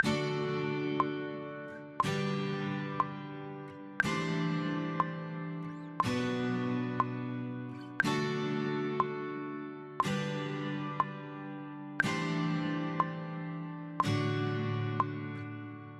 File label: strum guitar